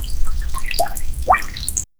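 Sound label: sound of water can watering, garden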